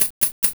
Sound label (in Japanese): mizukiri